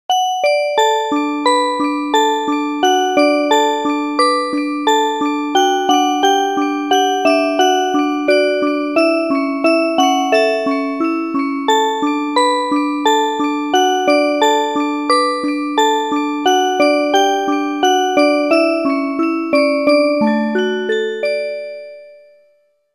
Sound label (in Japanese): オルゴール系音色の単音曲。; 23秒; FM-Townsの音楽作成ソフト「奏」がエミュで使えるようになった記念。; 作業用BGM